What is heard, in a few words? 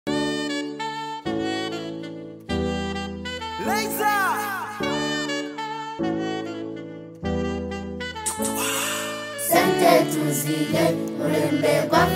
acoustic choir version; SYSTEM LIGHTER; Sente Royal